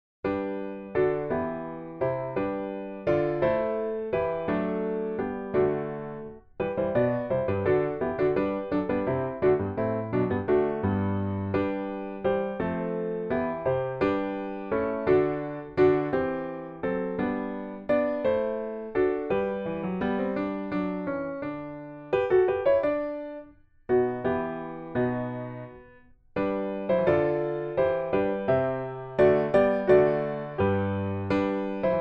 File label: PIANO